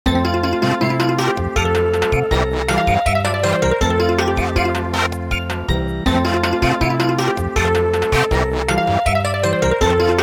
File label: Samba, midi traditional